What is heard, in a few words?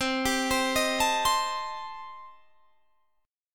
A Minor 7th Flat 5th C, chord